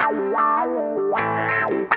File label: GUITAR; InVision Interactive; 40 Oz Phat Slammin Hip Hop